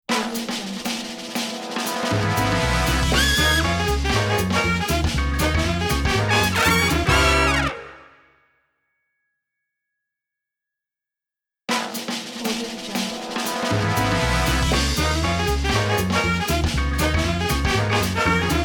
postmodern big band logo

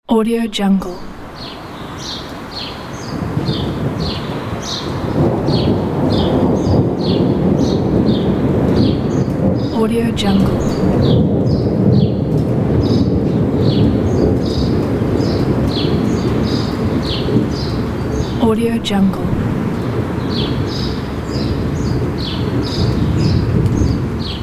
low thunder

